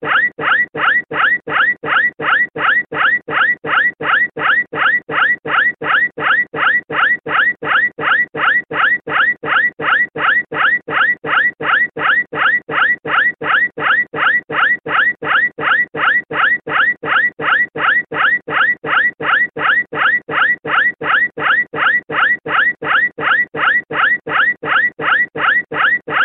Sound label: Yelp repeat